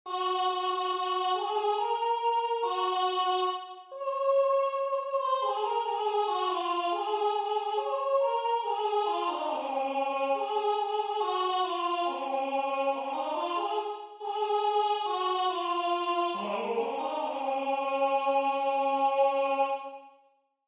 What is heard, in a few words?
rhythm